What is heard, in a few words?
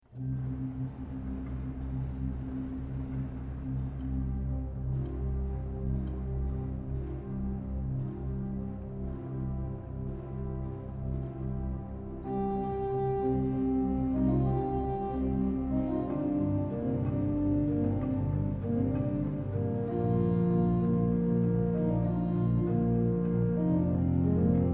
ORGUE